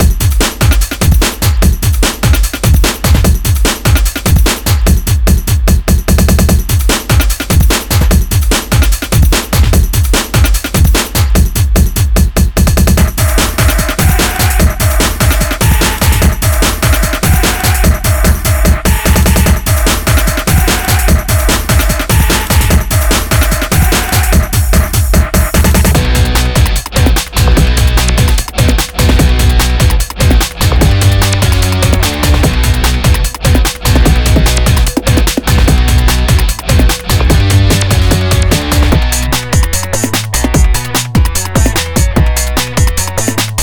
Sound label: slap dance